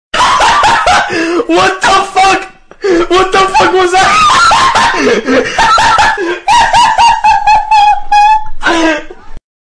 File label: hahaha